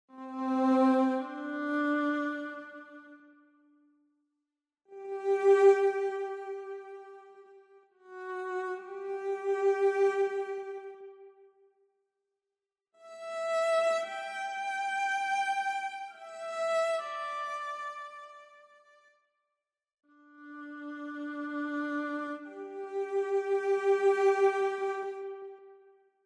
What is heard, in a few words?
emotional viola; Artinoise Recorder DAW